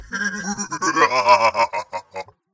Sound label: laugh evil